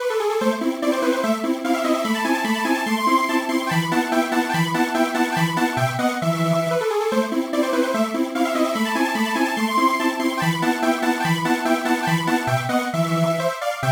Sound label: polysynth